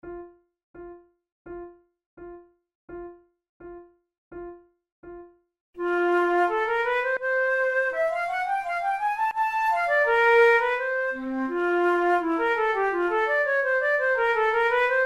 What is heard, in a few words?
flute